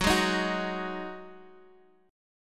Eb Minor Double Flat 5th Gb

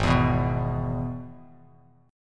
Gsharp Minor Sharp 5th, Bass Standard; strummed; 4,7,9,4

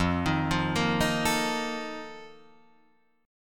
F 11th F, down, Guitar Drop D

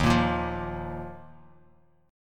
D sharp Suspended 2nd D sharp, Bass Standard; strummed; chord; JGuitar

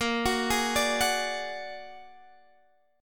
A sharp Augmented 7th A sharp Guitar Drop D

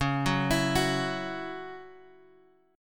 C sharp Minor Double Flat 5th C sharp, chord; C#mbb5 Chord {X 4 4 X 5 2} arpeggiated

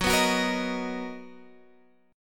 B Major 7th F sharp, chord

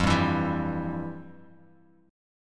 F Major 7th Suspended 2nd, Bass Standard; strummed; JGuitar

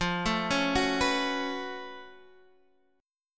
F sharp Minor Major 11th F

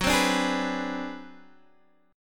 G sharp 7th Sharp 9th Flat 5th F sharp, chord

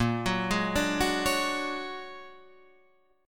Bb 7th Sharp 5th Sharp 9th Bb; Bb7#5 #9

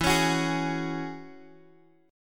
E 6th Add 9th E down Guitar DADGAD

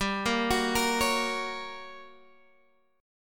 G Minor Major 7th Flat 5th, G, down

